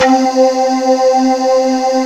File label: sky voice, Kawai; Rubber Chicken Software Sample Bank